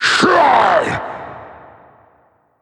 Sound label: meph attack; slender